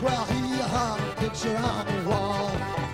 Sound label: music; wu xing; earth